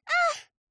Grenouille Aaahhh; mirumiru

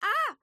Iguane Ah; mirumiru

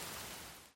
pluie fine; mirumiru

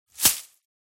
BRUIT CV ARBRE; mirumiru

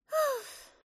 Grenouille Ouf, mirumiru

